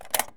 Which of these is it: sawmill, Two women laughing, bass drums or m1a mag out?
m1a mag out